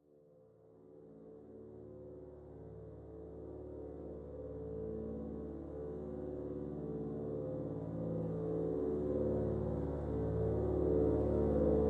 Orchestra Only

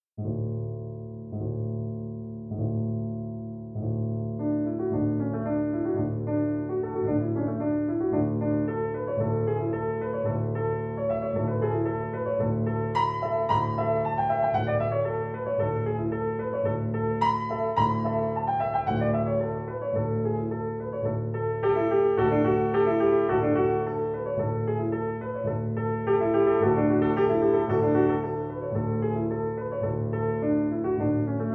Norwegian Dance; Edvard Grieg (PD)

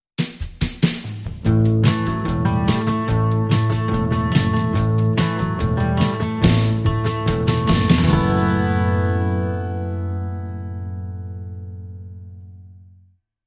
Country

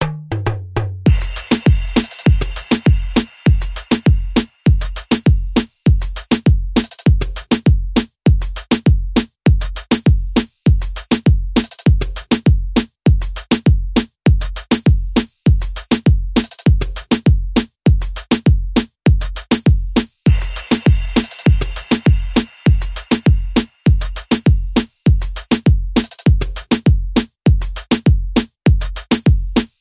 WAH WAH What Beat

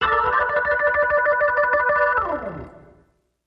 Organ Trill

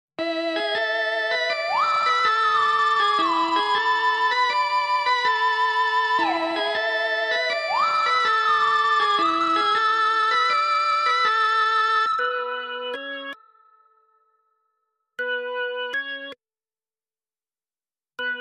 Keys Only

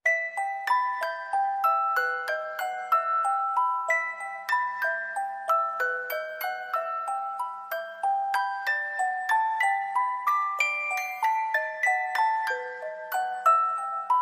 Music Box

